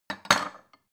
bowl shelve hard

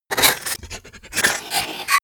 verbal noises

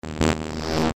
future buzzing elements layer

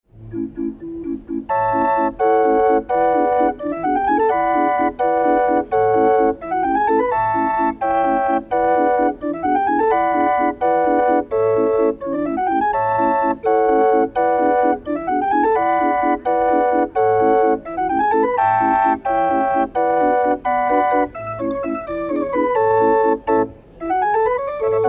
hurdy-gurdy

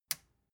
metal button release power on

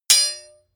hammer hit metal hard

